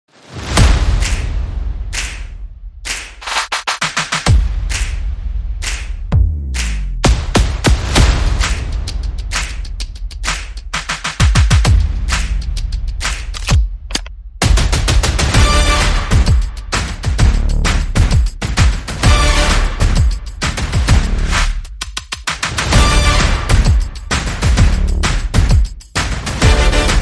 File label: No Guitars